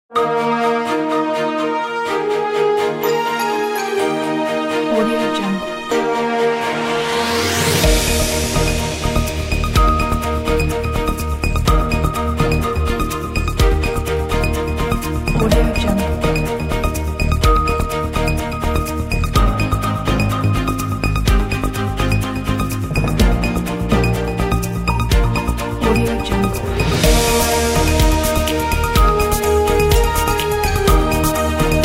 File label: broadcast